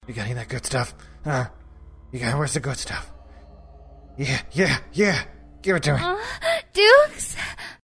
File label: moan mix